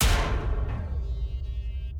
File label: Impact